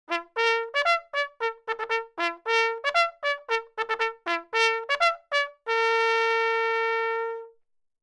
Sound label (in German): Aufmuntern zum Treiben, Jagdleitsignale